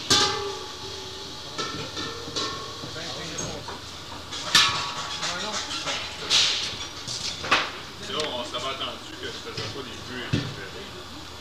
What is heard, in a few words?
knocking cylinders